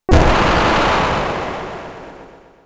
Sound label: blood; RPG Maker; Legend of Heroen v1.20